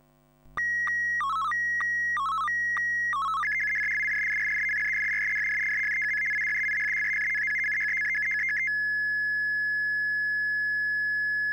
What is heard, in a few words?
ATV94 QRM Narrow, SSTV Signals; hamradio; AVT